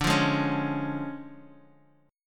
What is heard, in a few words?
D Minor Major 7th Flat 5th, D, Guitar; Listen to DmM7b5 strummed; JGuitar